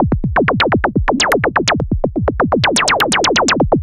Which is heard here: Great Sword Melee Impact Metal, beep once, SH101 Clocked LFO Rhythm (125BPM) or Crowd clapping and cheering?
SH101 Clocked LFO Rhythm (125BPM)